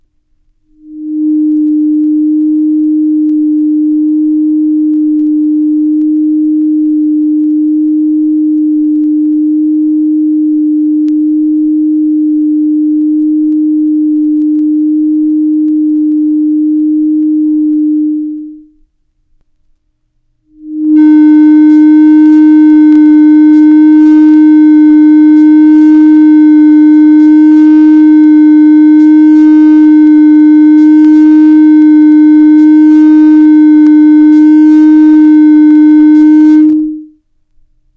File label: Test LP Played On Turntable At 3.08 Grams; Side A; Ion Audio TTUSB05; Hi-Fi News 002 Test LP